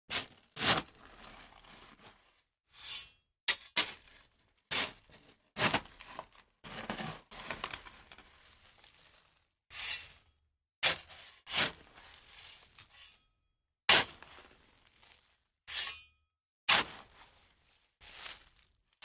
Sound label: Shovel Gravel